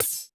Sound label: Mega Drums, Korg 01W